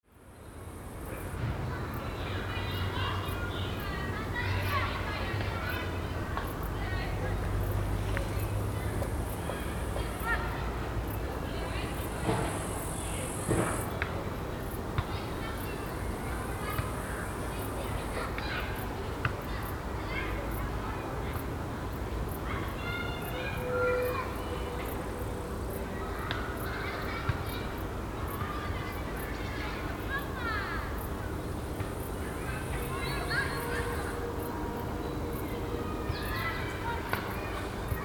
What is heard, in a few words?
pathway to reservoire, sergiyev posad; ptitsegrad